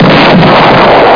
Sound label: Explosio; starwarriors